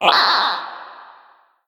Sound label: shopkeep death; Crypt of the NecroDancer